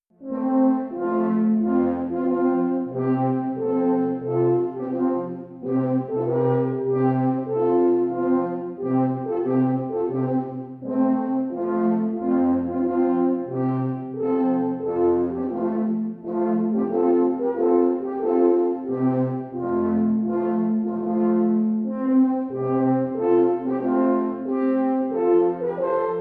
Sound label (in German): Marsch